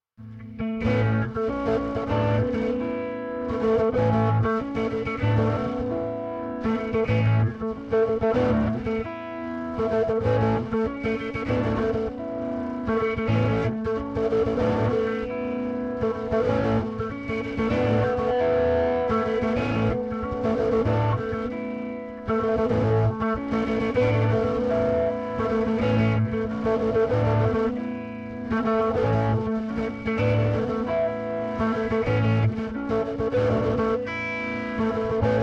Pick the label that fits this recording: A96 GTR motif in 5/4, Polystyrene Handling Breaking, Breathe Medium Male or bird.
A96 GTR motif in 5/4